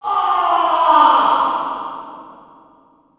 inmate agony

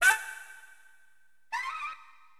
STAB; LiveAct Pool 1

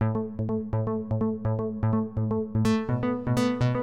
SYNTH HOUSE 125 A; eJay; Keys

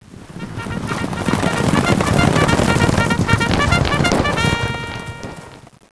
CAVALRY; Civilization II

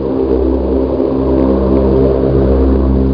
prop; doom; f27

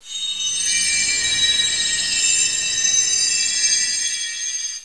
chimes; Babylon 5 - Entertainment Utility

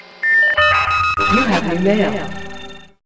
new mail notification; ALIEN; ALIEN Commemoration

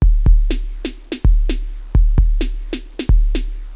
drums; instrumental

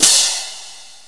Hand Cym; Software Explosion 2 - Virtual Computer Pets; yamaha xg; drums; open h

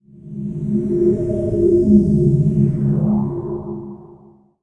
STARGAT

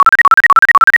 tone; cuddle n care

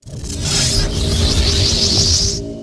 sorc cast; DAoC

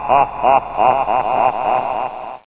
Spirit Laugh

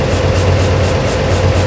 power plant; Incoming Forces; structures

